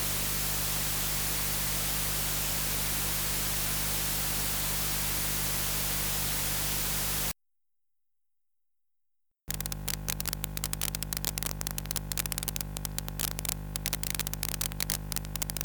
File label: Hiss Crackle Pop